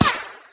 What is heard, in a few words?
deadshot damage; weapons; dota; muerta